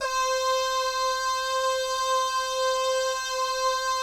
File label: BAH GUY, Jazz